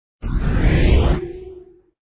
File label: swoosh; bubblespinner; html5 games